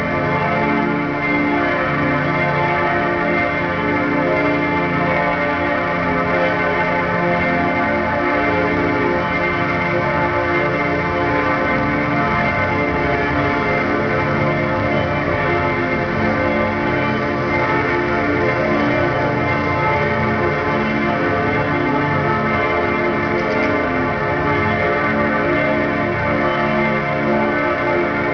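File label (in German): Glocken, Würzburg, Kiliandom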